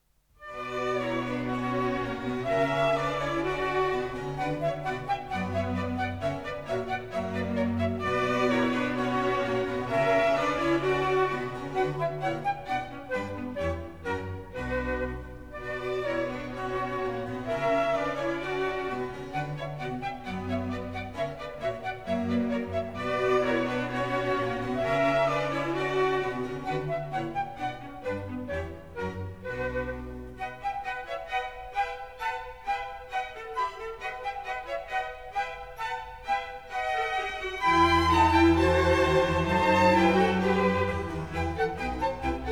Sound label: Bach Orchestral Suite; Orchestral Suite No2 BWV1067; Munchinger